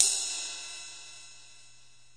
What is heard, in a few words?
long hi ride, DRUMS SINGLE HITS